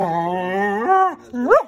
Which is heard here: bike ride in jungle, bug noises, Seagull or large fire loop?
bug noises